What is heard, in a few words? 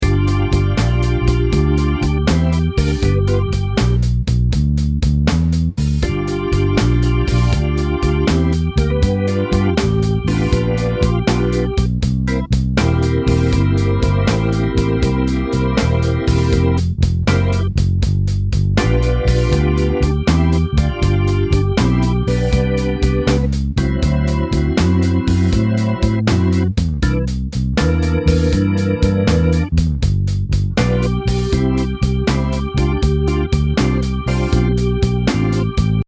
12 Bar Blues Shuffle G, loops